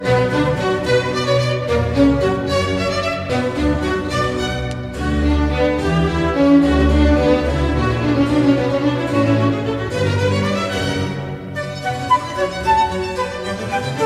concerto pour flute, allegro; CORRETTE (Michel)

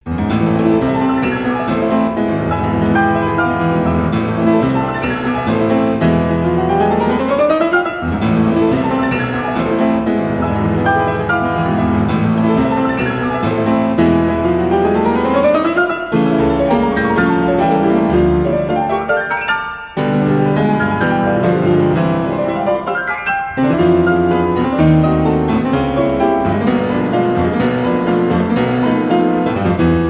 etudes pour piano; LISZT; d apres Paganini, etudes 11

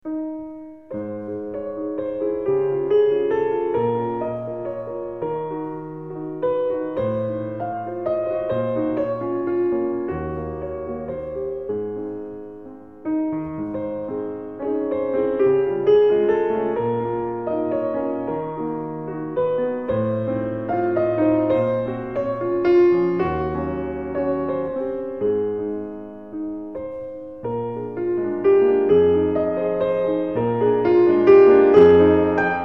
romance sans paroles pour piano; FAURE (Gabriel)

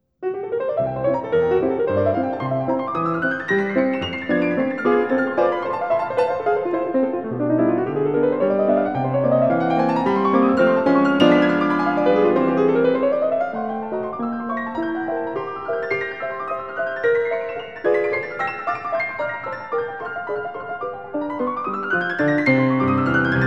piece pour piano, vivace; SCHUMANN

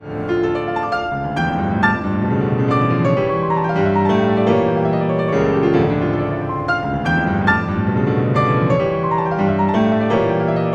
concerto pour piano, adagio; TCHAIKOVSKI

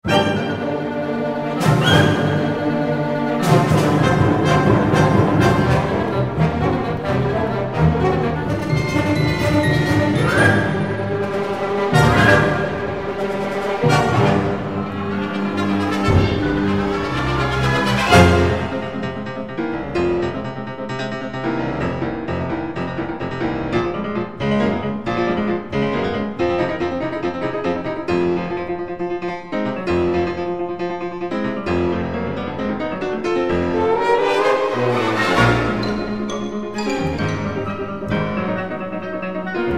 concerto pour piano, agitato; GERSCHWIN (George)

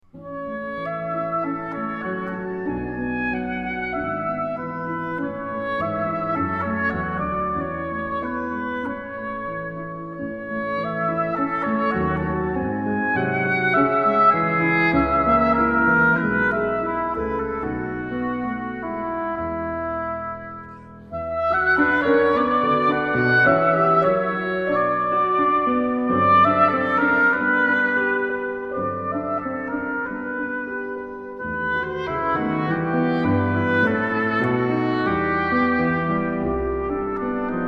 romances pour hautbois et piano; SCHUMANN; Einfach innig

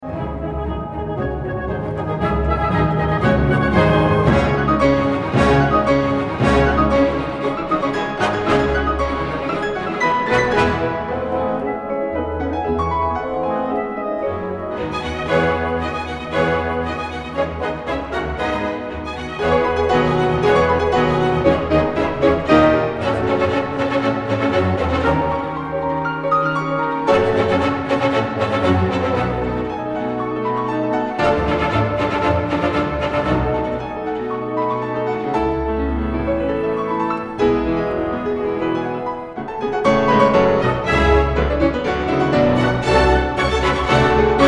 concerto pour piano, allegro; RIMSKY-KORSAKOV

